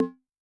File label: Ana Hi, Yamaha DTXpress-II Tom